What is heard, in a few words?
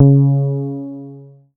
C#, PIANO; Big Fish Audio; Synclav FM